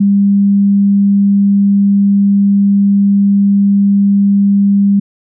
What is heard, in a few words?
stereo phase test; speaker test